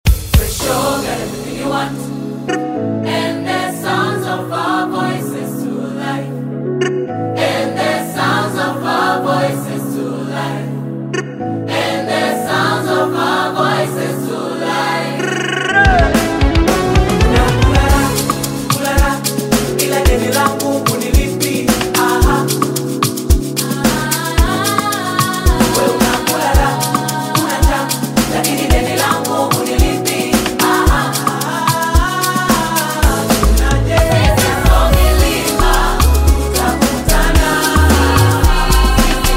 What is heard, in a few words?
Choir Cover